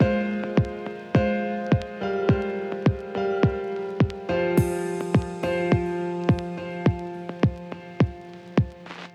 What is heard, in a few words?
Piano Chords; BB 2HTC Samples Vol 4; Solos